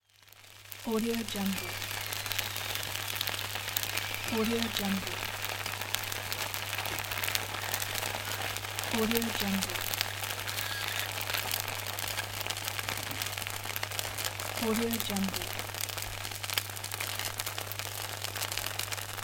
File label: egg in frying pan